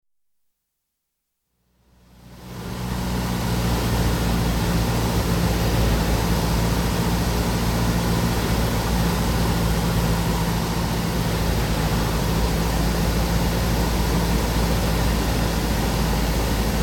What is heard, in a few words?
Cessna C150, interior, land, taxi, switch off (Light aircraft, single piston engine); Airplane Sound Effects; BBC